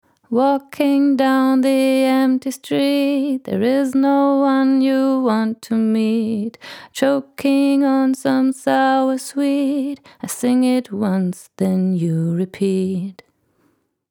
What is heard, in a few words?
Female Vocal; Tegeler Audio Vocal Leveler 500